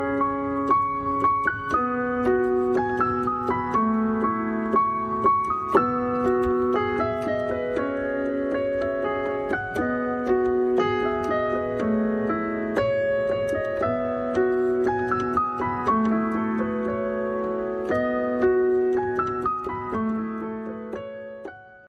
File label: remix music ringtone, bollywood ringtones